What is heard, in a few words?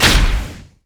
twinbite dragon; LoL; shyvana